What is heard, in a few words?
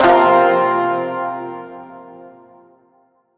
Shawzin Hirajoshi Chord A; Warframe